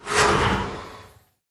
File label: spawn unit; tower; grim wraiths